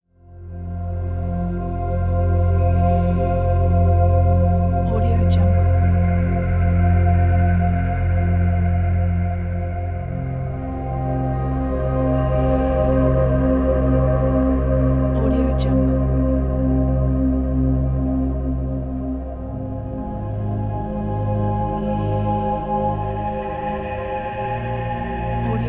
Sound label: atmospheric ambient soundscape